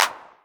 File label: Clap, Novation Drumstation; DrumstationV1